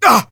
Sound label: pain; awesomenauts